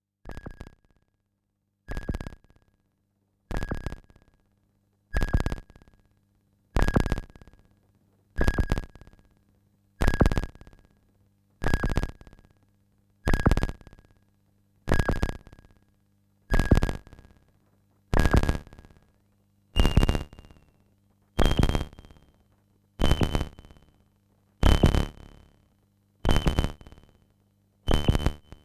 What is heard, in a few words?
EMS VCS3 Putney, synth; EMS VCS3 The Putney